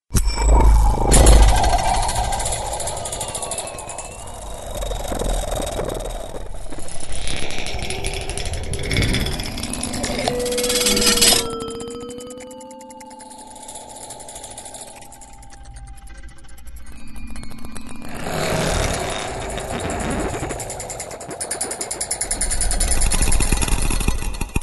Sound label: Anechoic Pulse, electronic music